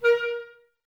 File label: clarinets, Wood Winds